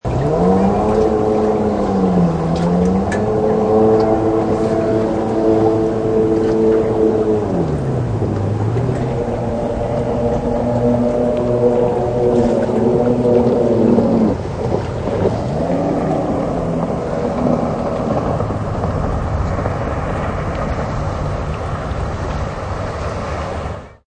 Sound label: Speed boat on a lake; Distant Speed Boat; Vehicles